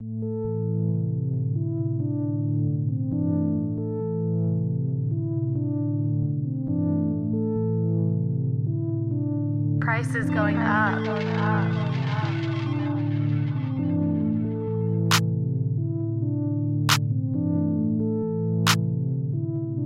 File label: Hard Ambiance